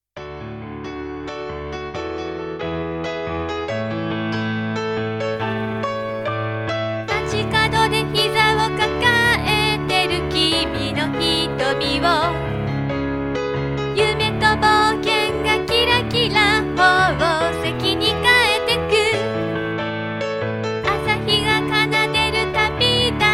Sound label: song